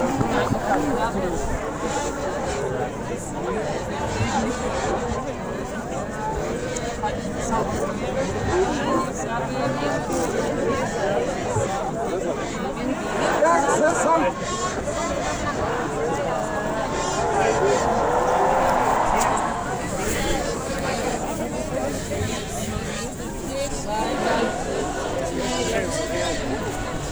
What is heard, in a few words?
foley